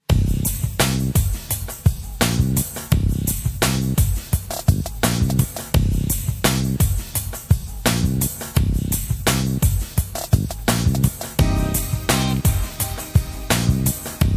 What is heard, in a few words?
Attitude Hip Hop